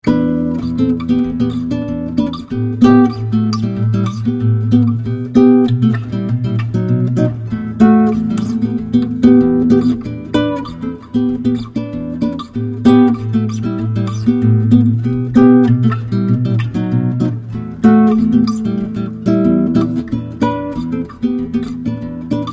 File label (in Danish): spansk, guitar; maestro spansk 39"